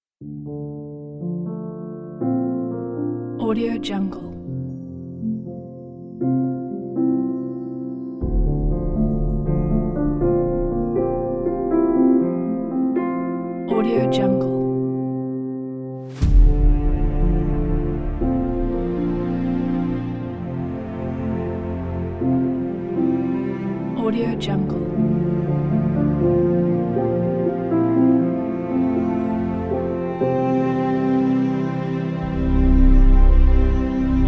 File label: be epic